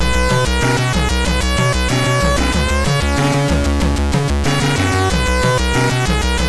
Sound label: Experiment, MOS6581R3